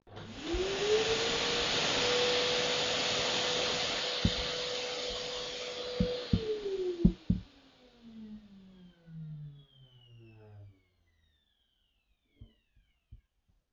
aspiro

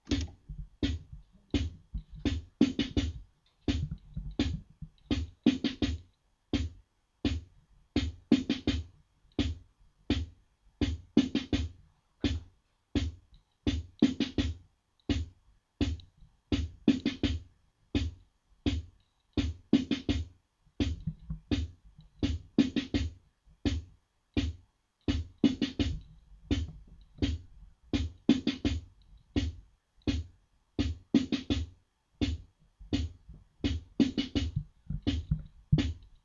tango

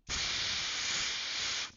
aerosol

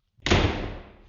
porte

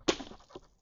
splash

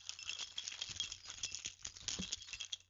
cles

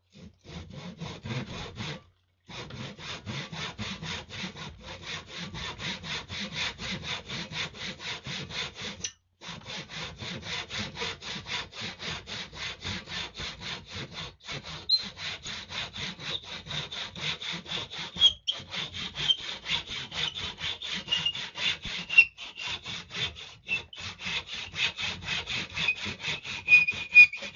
scie

